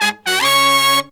Pop & Funk Brass, 120

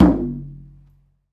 MalaiyuDrm

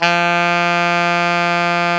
SAX XALTOS, Sax Sect.; Saxophones

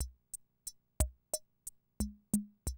CR78, DrumLoops

